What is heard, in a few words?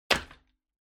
Drawing Machine Garapon, Handle Grab